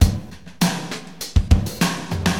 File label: DM LOOP, HipHop, Drums